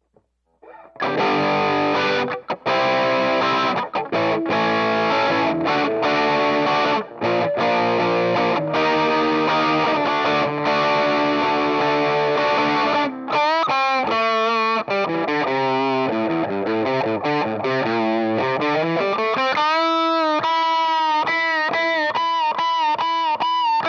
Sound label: 57 champ